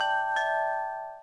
Global; QQ; Office

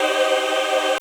choir fx

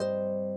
harp

